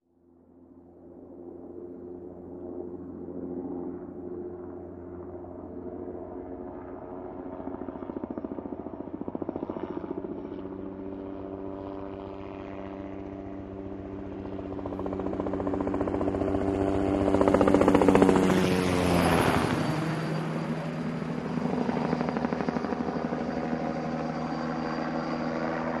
Helicopter By, Jet Ranger, Long Approach & Away, Nice Blade Whine, Doppler By